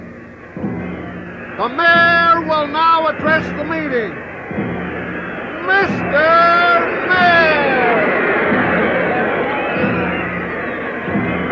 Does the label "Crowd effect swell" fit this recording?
Yes